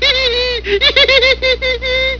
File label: homer giggle; simpsons